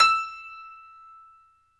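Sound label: piano, Grand piano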